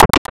Roto; RPG Maker; Legend of Heroen v1.20

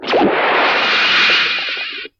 Agua; RPG Maker; Legend of Heroen v1.20

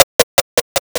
paso; RPG Maker; Legend of Heroen v1.20

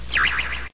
salto; ranma

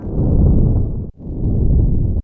Trueno; RPG Maker; Legend of Heroen v1.20

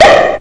huevo yoshi; RPG Maker; Legend of Heroen v1.20

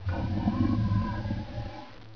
RUGIDO; RPG Maker; Legend of Heroen v1.20

